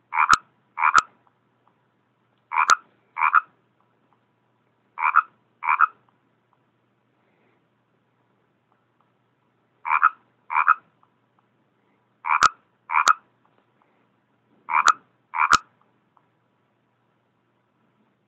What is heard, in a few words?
Frogs